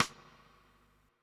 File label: FX rim; ALL RIM; USB Soundscan vol.10; AKAI; 90s sample CD